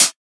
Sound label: hat, drum sample; Hats